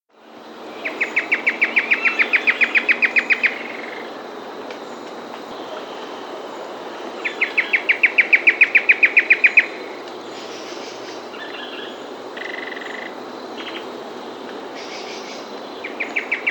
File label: Black-Hooded Antwren, Nature's Songs, S. America